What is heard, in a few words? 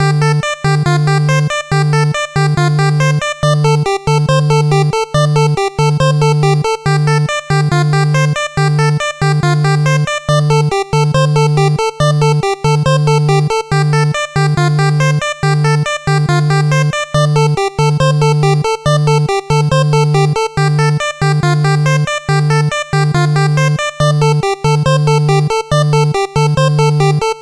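Mysterious spells, ショートループ チップチューン ファミコン風; いいもんみっけ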